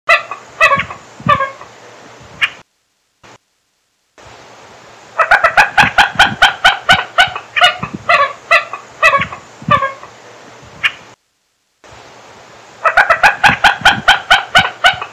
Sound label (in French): Gallinule d'Amérique Gallinula galeata Son; Pouldo